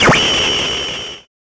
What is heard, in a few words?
Sword 8 Bit